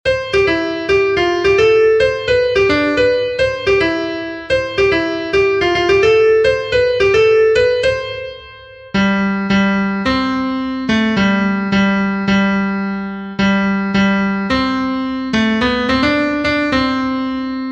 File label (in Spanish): TENOR, himnos en piano; cantos del camino